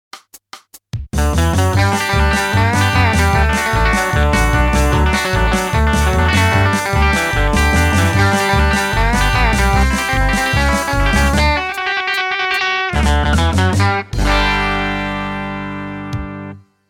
country

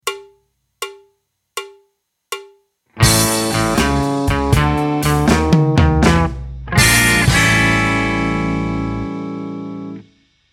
blues

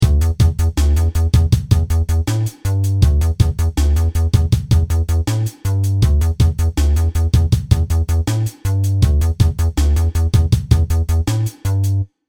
bass

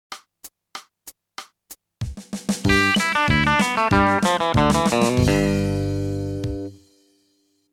country

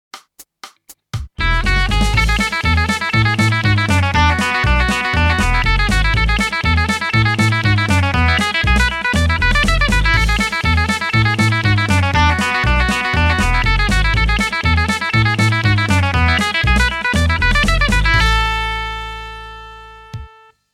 country

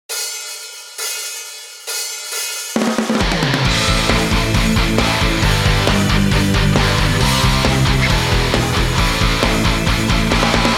karaoke

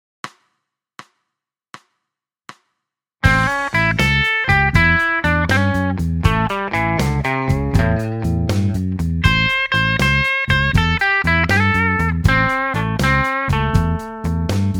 blues

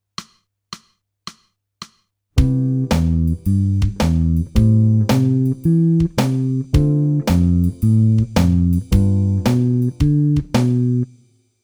jazz

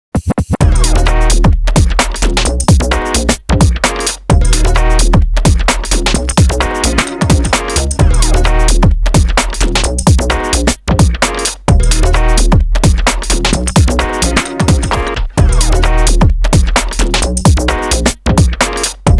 Song2 BPM130

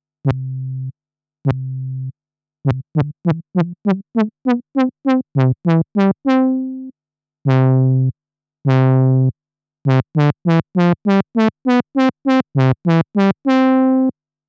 Decay

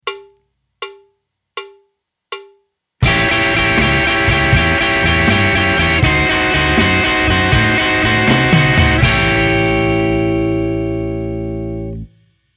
blues

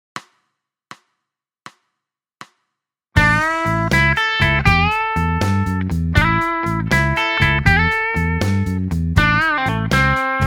blues

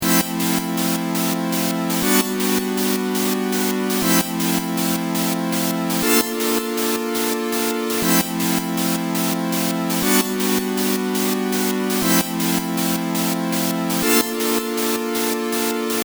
strings, ストリングス系音色; Modular3をSTEINBERG Nuendo上にVSTインストゥルメントとして立ち上げ、フレーズを打ち込んでバウンスした; 魁Reaktor道場

